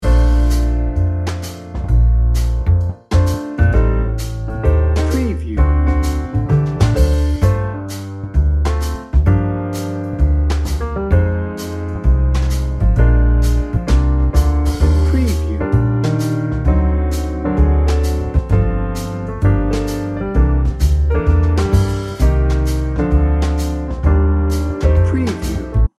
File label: mellow jazz loop